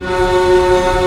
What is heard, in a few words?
STR F#0 PP L, STRNGS, Orchestra; Sound On Sound - Peter Siedleczek's Orchestra